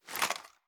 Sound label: ar ads down; weapons; gmod; viper